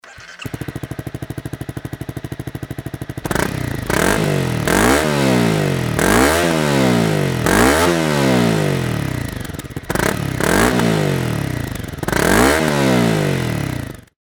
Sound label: cb250r lci short titan; mc52